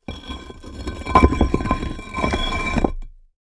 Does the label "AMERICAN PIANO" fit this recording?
No